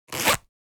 Coin pocket zipper wallet; foley; Wallet and Purse

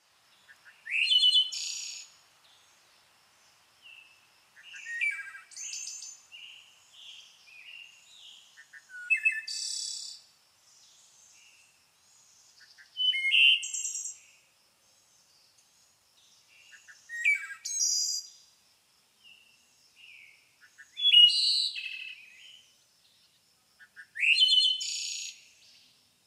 Suburban ambience spring evening with birds

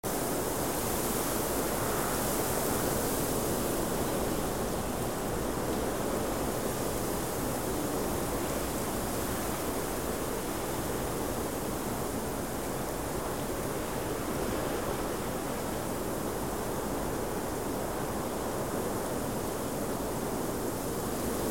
Blizzard icy wind snowstorm ambience loop

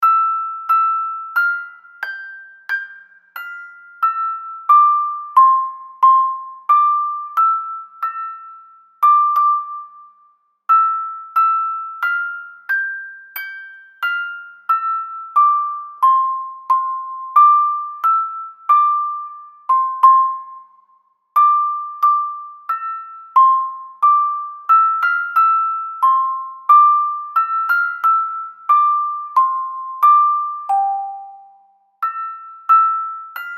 Ode to joy music box

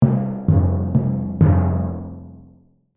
Marching field drum accent

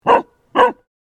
Playful dog barking barks

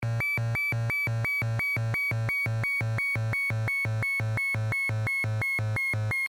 Futuristic alarm descending tone loopable

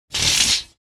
Metal rail curtain rings sliding; blinds

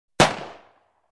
Winchester 12 single shot

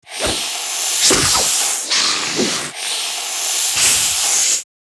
Snake rapid strike attack

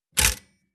Steam lever machinery mechanical pull turn off

